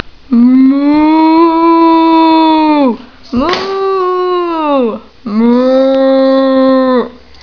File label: moo